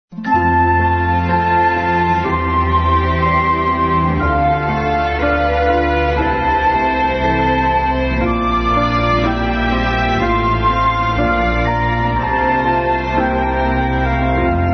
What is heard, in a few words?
interval signal; vatican radio